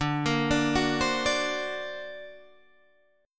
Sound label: D Augmented 7th D down Guitar Open D